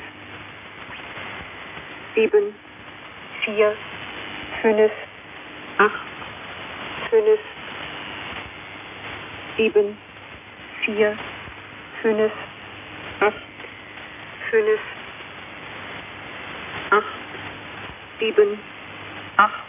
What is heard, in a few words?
winxp groundloop